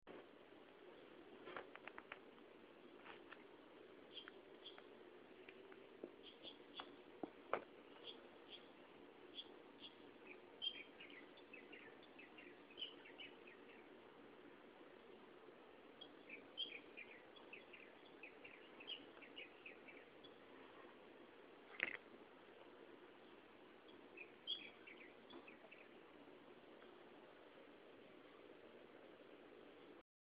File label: taipei city ambient